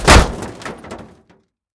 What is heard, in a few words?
metal; CosmicRage; combat; bigclaw